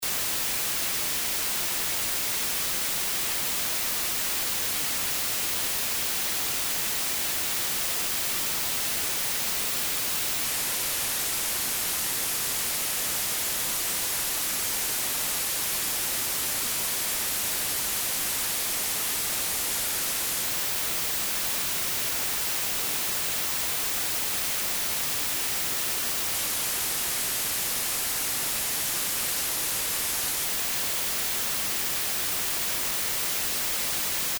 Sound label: Elysia LC Air Noise